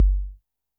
korg trident

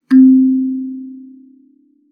KALIMBA C4; Xsample VOL-7

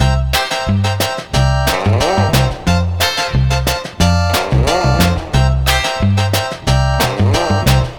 Lounge Full Mix-C; Zero-G Kocktail Kollection; Construction Kitz (Lounge); Welk Lounge 090

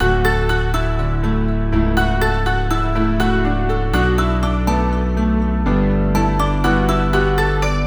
Dmaj 122BPM; Monosounds; Coconut Coctail; PANDORA BOX!

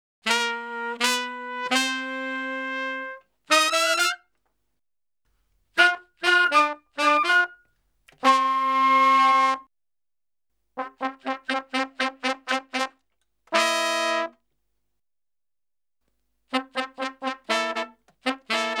Salsa Picante; Masterbits; World Zone VOL-3

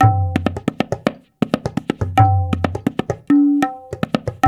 Tablas

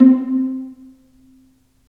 Vas Pizz; STR Vlas Pizz

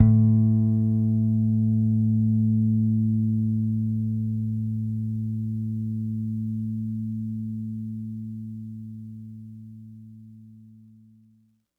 Bass Ending, 70s Analog Funk; Sonic Foundry (Sony Creative Software); What it Is! 70s Analog Funk

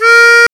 HARMONKA, Fairlight CMI, WOODWIND; Fairlight CMI IIX, WOODWIND

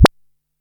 DR-660 Perc, Drums, Boss DR-660

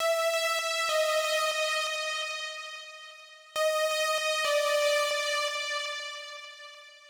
Synth Riff E Eb D, 135bpm; Zero-G Sound Sense Grime Scene; GS Construction Kits; The Hoodrat; 90 s Sample CDs

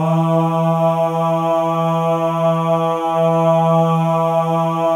MALE AHS, Voices; Spectrasonics

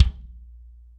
BD DRY, Drums; Best Service; Real Mega Drums

